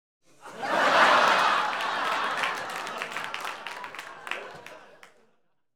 Light Entertainment Audience, Audiences Crowds; Children Crowds Foots; BBC Sound Effects Library

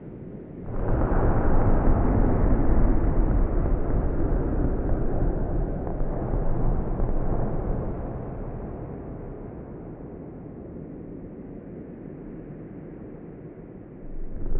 Solar Wind, Eruption, Pulsating, Space Drones; Double Trouble Audio; Mercury

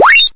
pickup; Kenney's Sound Pack; Retro Sounds 1

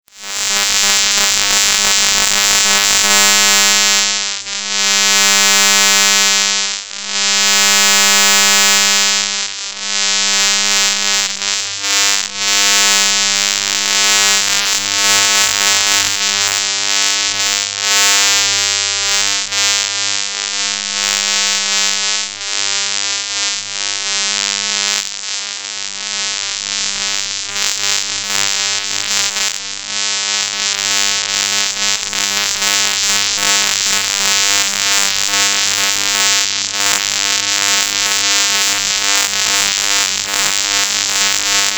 Electrical Sound; FXHome

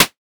Mid Perc, Data Mosh Hits; Snare - Clap - Mid; 99Sounds; Mangling Audio

